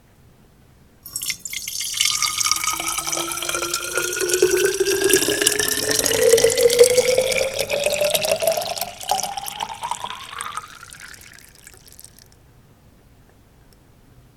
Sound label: pour water